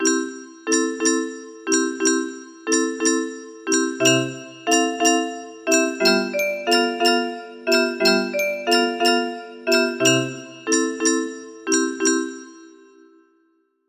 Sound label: melody